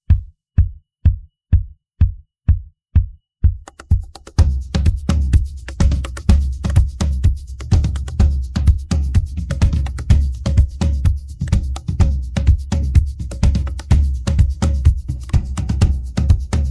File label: pattern jungle, drums